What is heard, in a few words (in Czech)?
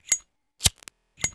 zapalovac; Mafie 1